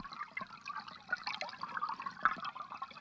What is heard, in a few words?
Waterway Dark, Water; outdoor